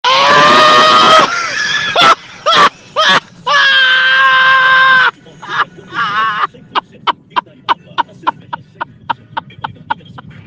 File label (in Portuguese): risada